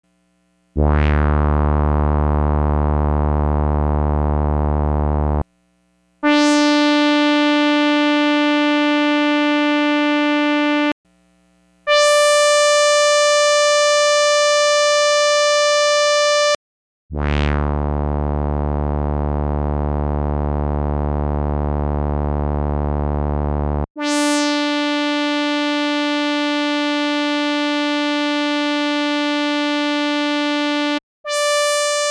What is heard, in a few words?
Compare CS80 Brass; Xils